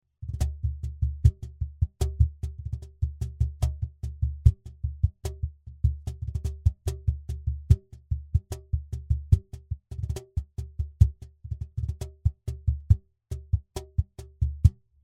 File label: BL8 Omni Cajon Classic